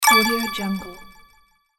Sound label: puzzle sound